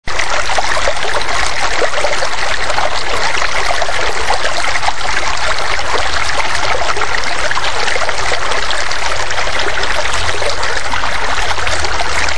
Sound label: stream